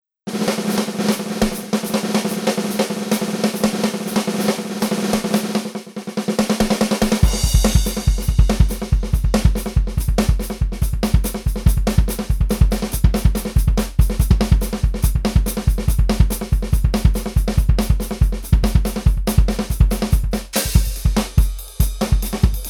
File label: dry clean donut piccolo drums only; tascam